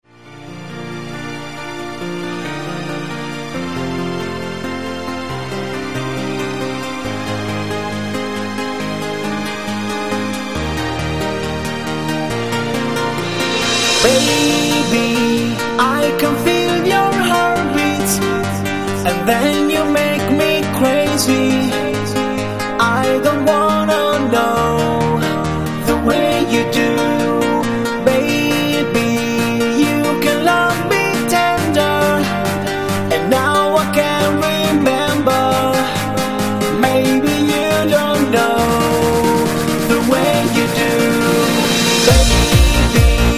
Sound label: Piano